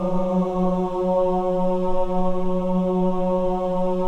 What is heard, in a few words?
CHO AH, Choir of Ahs; Roland - LA Composer vol. 1